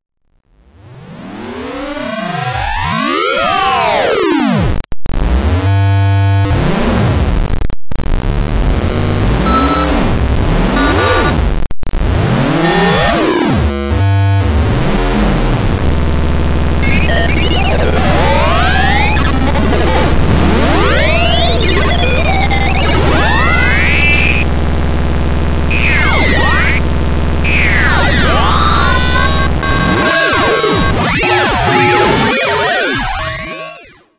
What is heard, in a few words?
ringmod terror